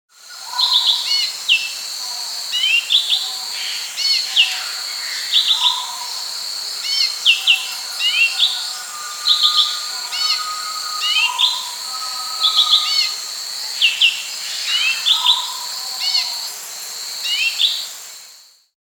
Orange-Bellied Euphonia (Euphonia Xanthogaster), Andean Birds; S. America